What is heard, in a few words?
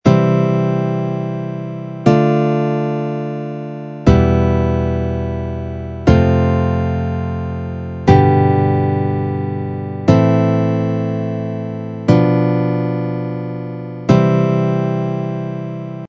c major chord scale, guitar